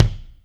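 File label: kick Amb Deep, Alesis D4 drum machine; 300 Drum Machines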